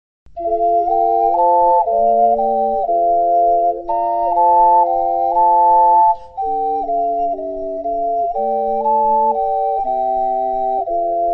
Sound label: ave gregorian, ocarina